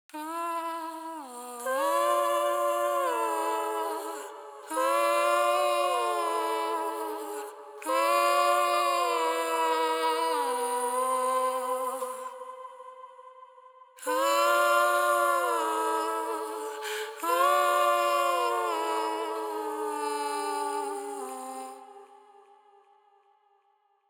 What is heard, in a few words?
outro backing vocals